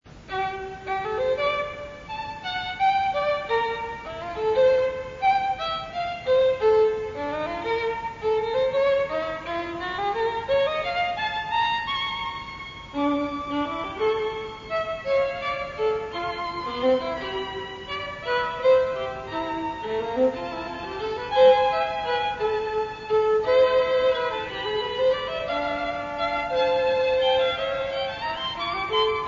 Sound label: Quartet